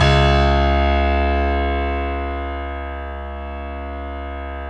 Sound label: StereoGrand, Keyboards